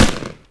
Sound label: shot; Shadowman; weapons; mac10